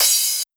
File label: cym